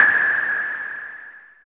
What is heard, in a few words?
JUNO NOISE, JUNO PERC; Drums In Your FACE!